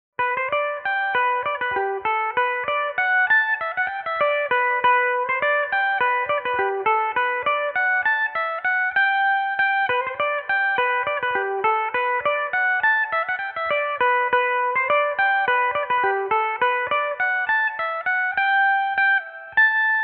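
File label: polka G major belmuse, irish mandolin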